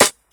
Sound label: 2000s airy snare sample g key, snare sound tuned to the G note; snare drum samples